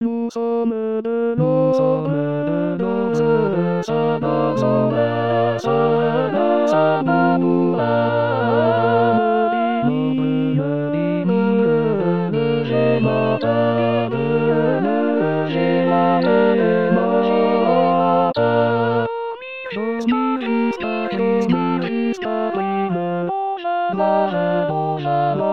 tutti; saint babouyn

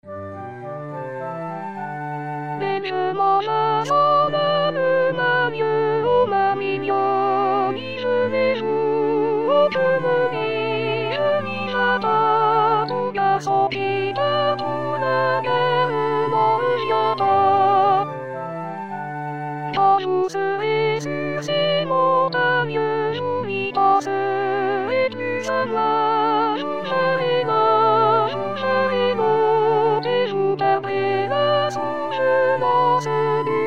soprano; belle je m'en vas; 2026 saint jean

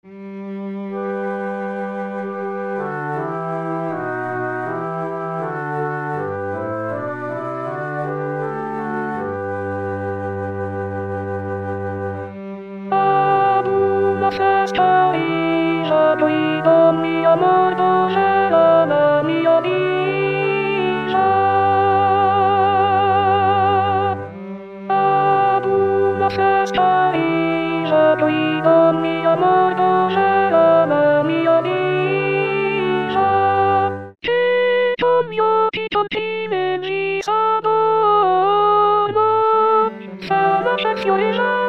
fresca riva alto, vox; 2016 vox maria

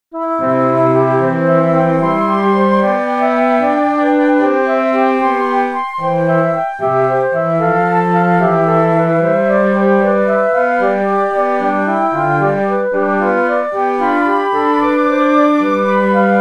sonatina; 2019 st jean

